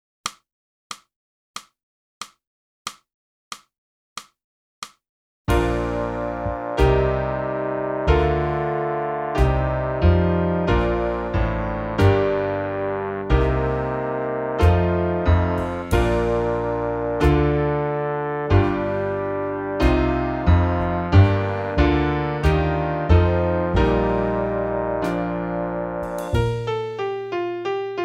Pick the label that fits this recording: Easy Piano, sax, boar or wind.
sax